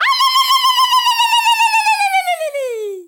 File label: FEMALE RAVE; Spectrasonics; Heart of Africa